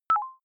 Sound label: notification message ring, signale